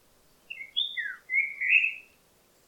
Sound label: Icterus parisorum; Ecología del Paisaje Sonoro